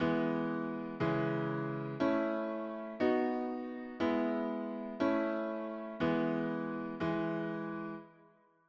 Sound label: analytical shift medium, music